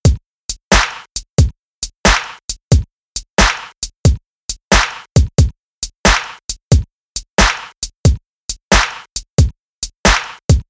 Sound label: Triplet Swing Example